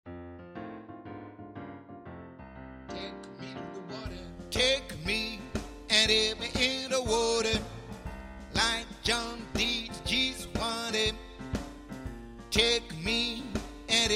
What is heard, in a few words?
Soprano